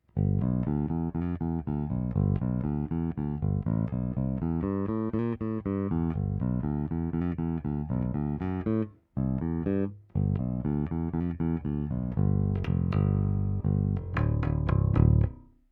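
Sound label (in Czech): S-x prsty, nerezová ocel, Roundwoundy, Schecter SLS Elite-5 baskytara; D'Addario EPS170 ProSteels 45 - 13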